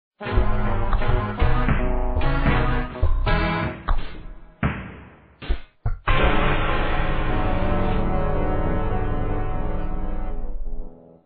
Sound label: course clear; vapesquared